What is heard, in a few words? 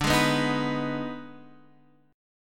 D sharp Major 7th Suspended 4th Sharp 5th D